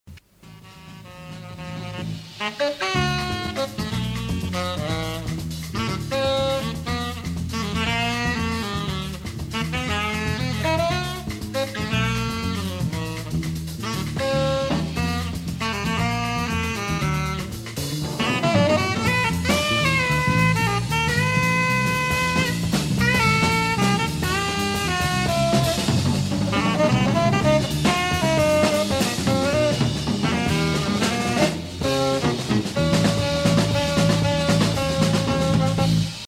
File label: Bossa